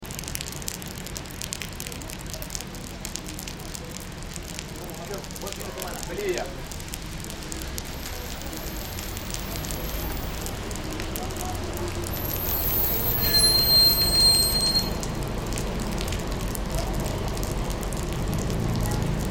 calles lluvia; rioja y maipu

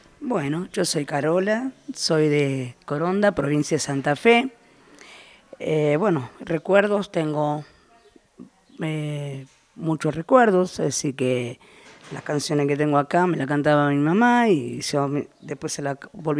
canciones de cuna

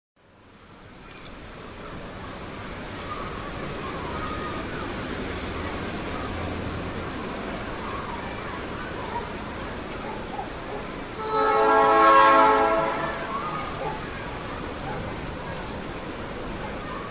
calles; machain 1400

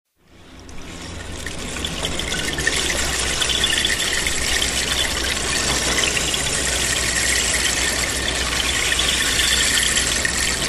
calles peatonal san martin puestos artesanos

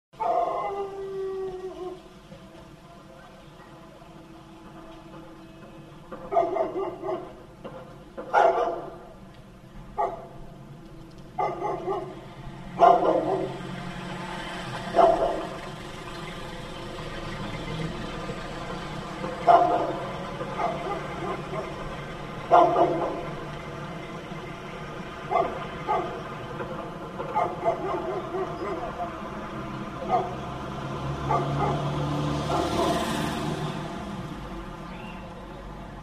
calles; almafuerte y leguizamon

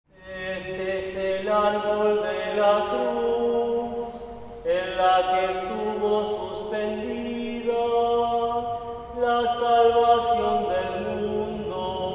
parroquia san pablo misa viernes santo; villa constitucion

